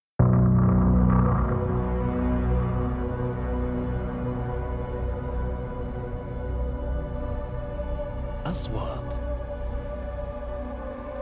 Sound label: Music Horror